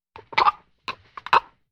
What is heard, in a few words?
Piling up blocks